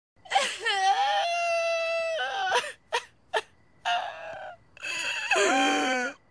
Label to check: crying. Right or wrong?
Right